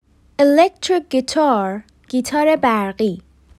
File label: electric guitar